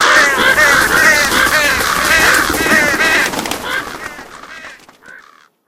crow flock farm; Left 4 Dead 2; Source Engine

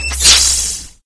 combine shield deactivate; video games; ambient; source engine; portal2; outland

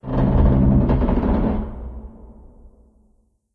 metal randoms, ambience; source engine; portal2

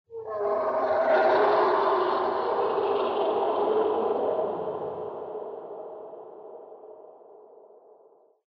animal call yeti, lair; Source Engine; tf

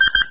blip

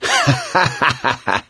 laughter, gambler, survivor voice

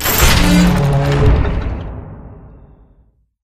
door open

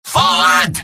medic battlecry; mvm

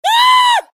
halloween scream

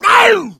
hunter shred, attack voice

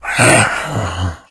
death, infected male; npc; Left 4 Dead 2; Source Engine

